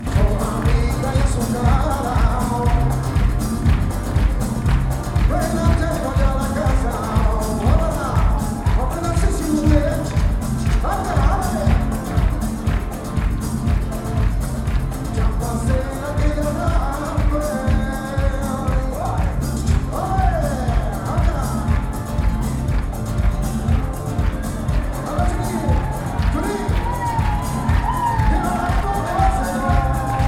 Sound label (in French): Son concert